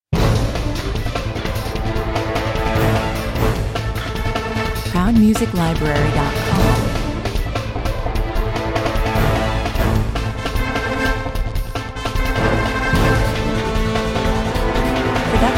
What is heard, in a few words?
action cues tank attack 127 bpm